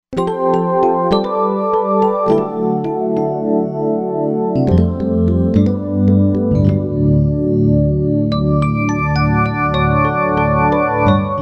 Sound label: Ring EP